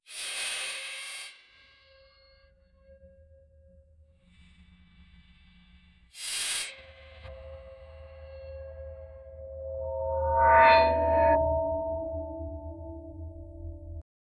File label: metallic transformation, clicks